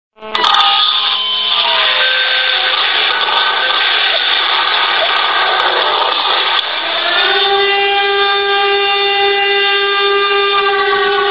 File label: Alarm Siren